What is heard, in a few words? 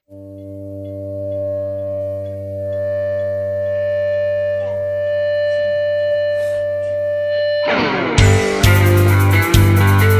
(G) no backing vocals